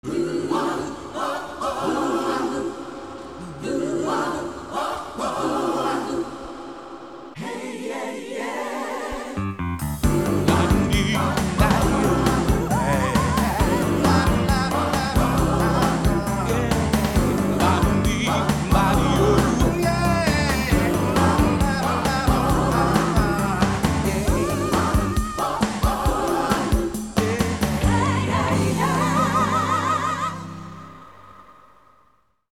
Happy Choir

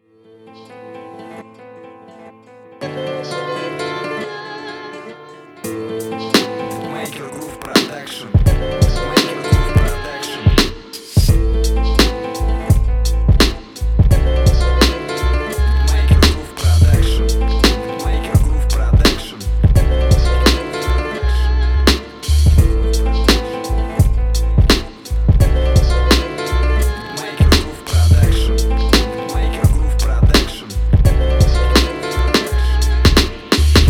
Beat OldSchool 85bpm

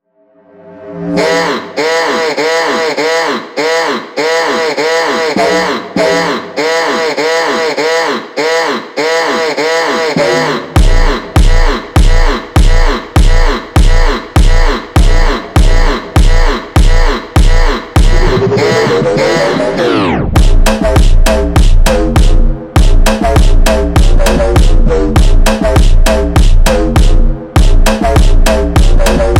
Funk

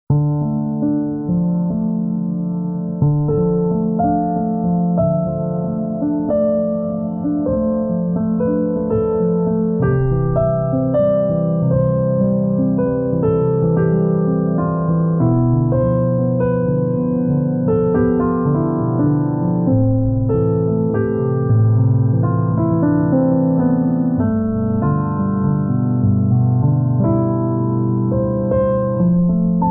Piano Peaceful, Meditation

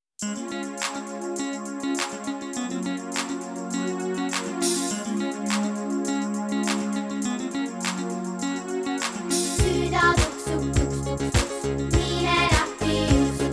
jazz-poplaulu

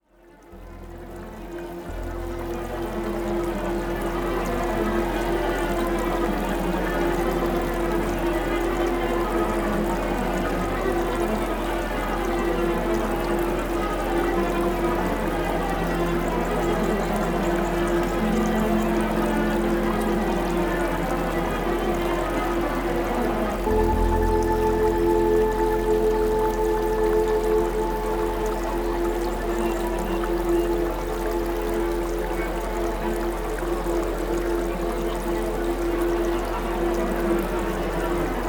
Dark Ambient Music

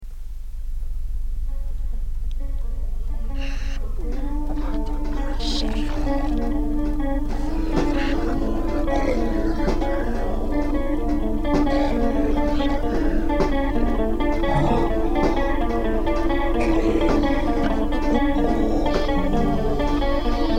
guitar

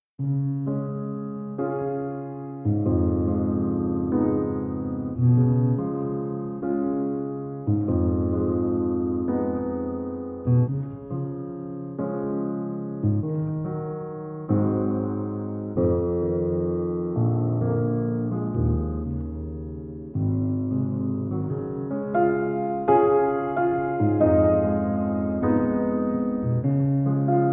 Relaxing Piano Music Simply Piano Ambient Piano

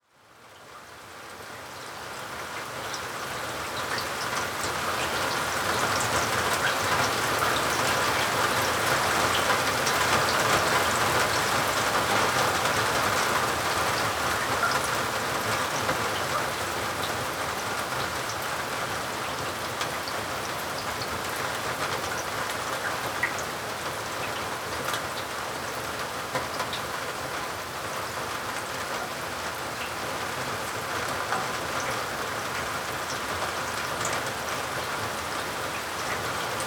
Rain Sounds

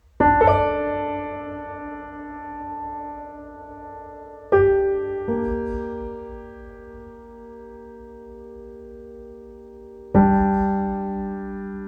Piano Theme

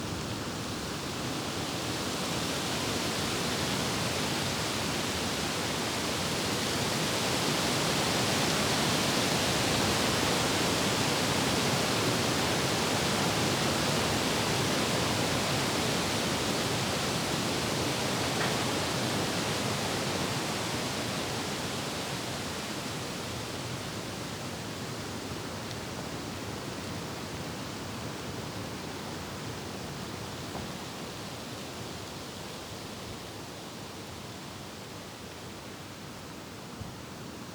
Wind Strong Forest

